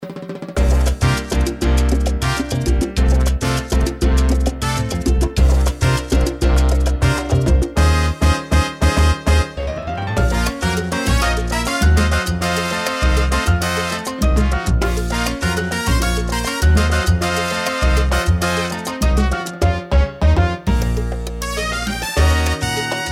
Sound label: salsa